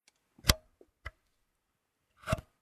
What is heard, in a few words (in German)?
Belichtungszeit, Kamera Kodak BROWNIE Nr. 2; Canadian Kodak Brownie Nr. 2